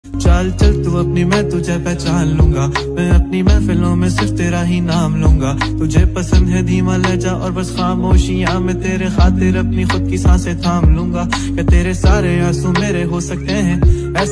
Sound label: hindi rap ringtone